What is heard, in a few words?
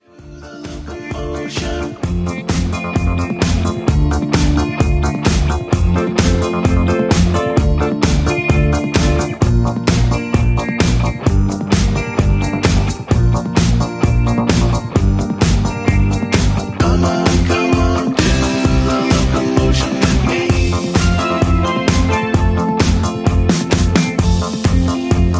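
Instrumental with No Leads and background vocals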